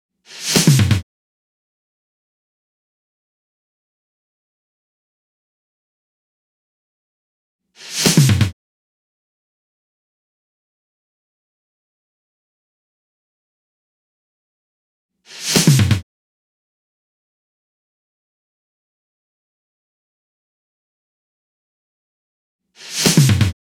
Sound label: 128 BPM Drum Fill; Vengeance Essential Clubsounds Vol.5; VEC5 Demo Constr Kits; Slide Kit; Root G; Drums & Perc